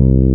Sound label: FRETLESS C2; Big Fish Audio; Synth City